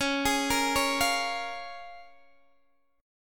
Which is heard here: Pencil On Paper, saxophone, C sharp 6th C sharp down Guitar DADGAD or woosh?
C sharp 6th C sharp down Guitar DADGAD